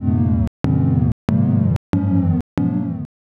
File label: laugh crowd